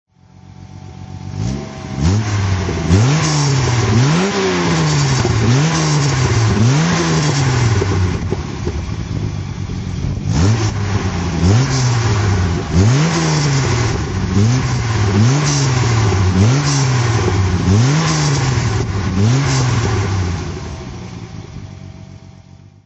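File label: etype 42 fhc throttle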